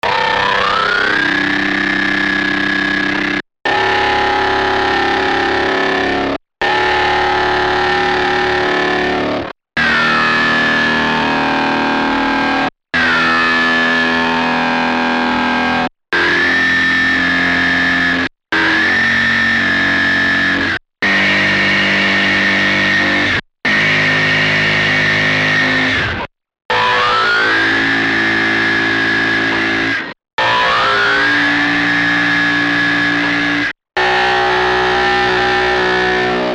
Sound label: Chromatic